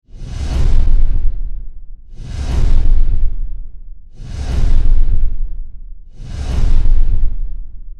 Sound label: Dragon Wings